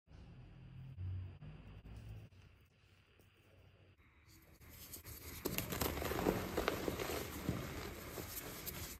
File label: chalk crunch; له کردن صابون گچی; اسمر